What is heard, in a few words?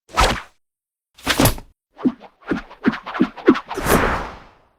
twirl; bonny betty